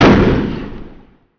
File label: Boom; mahouteq